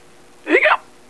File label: hiccup; Gold Medal Software Volume 1; music; digi wave